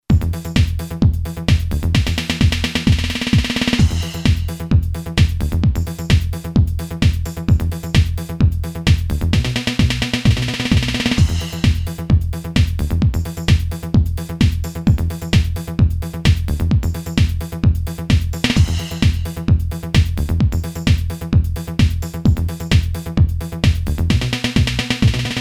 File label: TECHNO, Boss DR-3